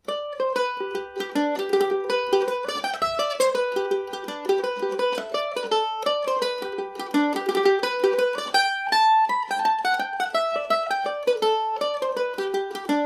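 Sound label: reel, G major, irish mandolin; The Honeymoon Reel; Reel (4/4)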